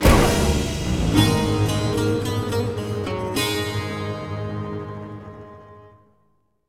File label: Bosscombat Lose; The Hobbit; PS2